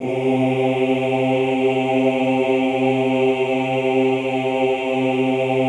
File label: OOH C2, Classical Choir; Best Service; Extended Classical Choir; OOH MIX